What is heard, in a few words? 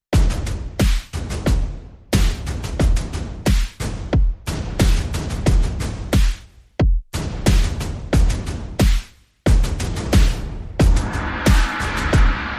Percussion Only